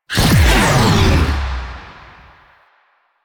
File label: Missile Ability Launch Start, Motorcycle; Motorcycle Ninety Nine; Vehicles